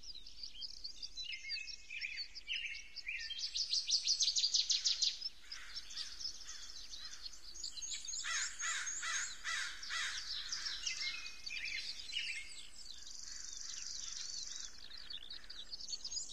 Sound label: Puzzle Ambience; gamerip; Hay Day Pop